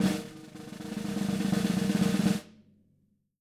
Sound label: SN ROLL, SD ROLLS, Living Drums; Sampleheads; Peter Erskine